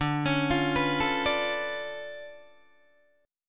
D Minor Major 13th, down, Guitar, DADGAD

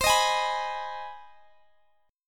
C Minor Major 7th Sharp 5th C; CmM7#5; strummed

